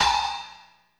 LOFI HAT, LO-FI KITS; Spectrasonics - Burning Grooves CD2

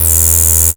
CREEPY E1, sequencer; Best Service Alpha Dance vol.2

